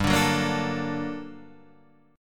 G Minor Major 9th, guitar chord

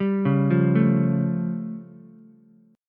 A Augmented 7th A, Ukulele; arpeggiated; JGuitar

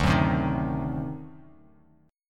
D 7th Flat 9th D down Bass Standard; D7b9 strummed; JGuitar

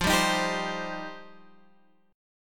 F 7th Suspended 2nd F down

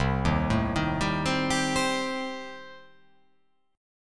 C Minor 6th C

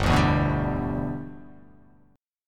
G 6th

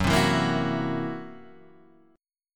Gb 7th Flat 5th Gb Guitar Half Step Down

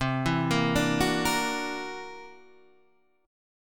C 9th Flat 5th C down Guitar Open D

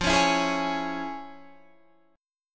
F Minor Major 7th Sharp 5th, chord

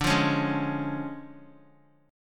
D Minor Major 7th Flat 5th, down, Guitar; DmM7b5 strummed; JGuitar

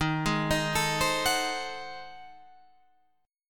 G sharp 7th Flat 9th D sharp, chord

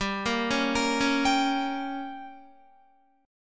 G Diminished, Guitar, chord; arpeggiated; 14,13,13,15,13,15; 4F,4A,5C,5G,5C,6E; JGuitar

